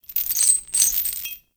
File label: Witch Station Material Texture; Ambience; Soul Plane